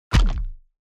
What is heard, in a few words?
room damage kick